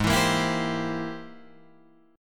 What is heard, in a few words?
G sharp Augmented Major 9th G sharp Guitar Drop D